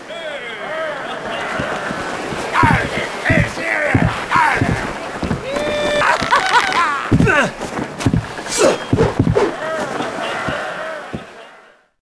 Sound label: duel spin intro sfx; Sid Meiers Pirates; XBOX